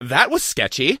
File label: hurt